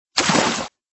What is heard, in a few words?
Splatsh